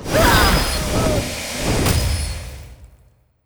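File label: Boss Wind Queen death; monster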